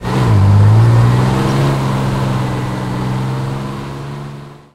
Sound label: valentine engine load, tanks; cannons; War Thunder